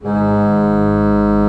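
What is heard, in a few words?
Pedal G#1 Right, church organ; Best Service; Advanced Media Trax Modern Composer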